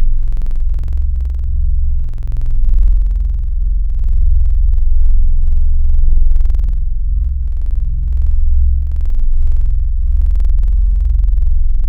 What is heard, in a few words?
soft voices; Synthetic's Serum Collection; Chill Trap 2 Tables